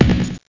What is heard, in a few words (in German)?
Takt